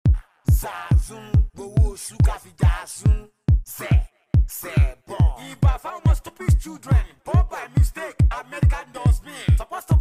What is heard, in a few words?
Cruise Beat